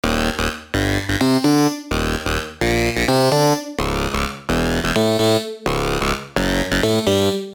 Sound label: Synth Lead